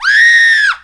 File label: Scream, Korg M1